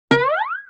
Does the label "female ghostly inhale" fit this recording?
No